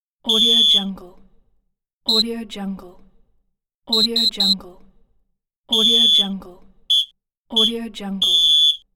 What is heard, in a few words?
outdoor referee whistle